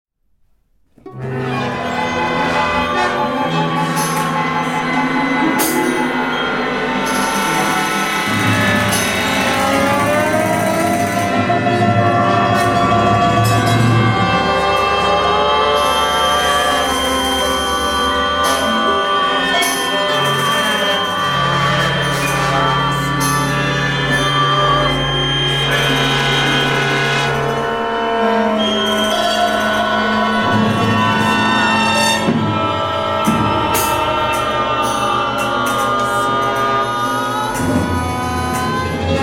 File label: For Voice, Ensemble and Tapes; Prajna Paramita; 3 Tapes